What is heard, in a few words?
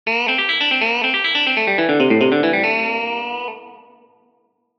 am pentatonic